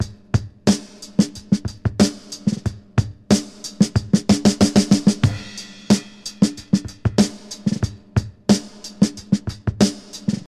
92 bpm modern drum loop sample g sharp key, Free breakbeat - kick tuned to the G# note